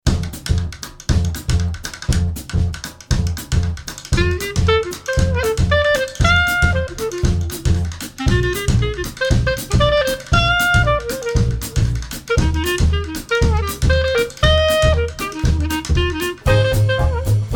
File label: Jazz Band